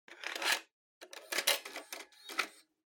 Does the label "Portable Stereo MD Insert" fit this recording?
Yes